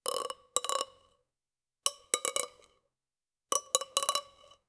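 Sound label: bowl clink gentle chopsticks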